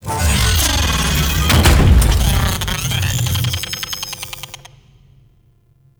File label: Jackal Redux Intro Slam; Warframe